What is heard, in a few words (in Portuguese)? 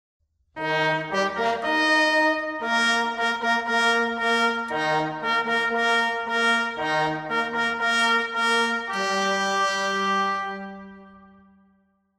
Toque Silencio, Fanfarra; mp3 tropa